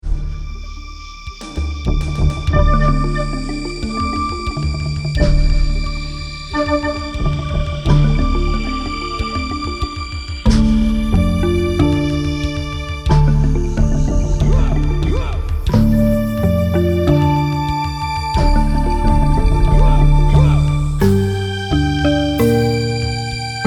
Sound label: arp